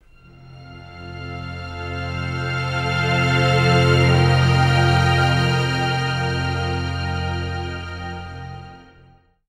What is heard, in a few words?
Ambient; Rise of Nations: Rise of Legends